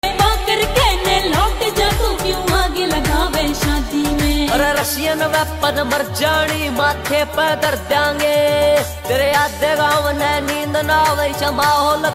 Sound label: Russian Weapon Ringtone